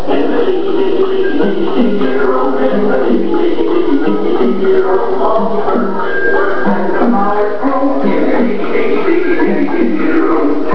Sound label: More music from the tikitikiroom; recordings from Disney theme parks and movies